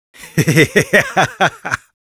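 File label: laugh evil, German; Sniper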